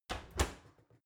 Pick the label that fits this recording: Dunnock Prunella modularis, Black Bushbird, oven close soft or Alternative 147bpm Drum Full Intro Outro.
oven close soft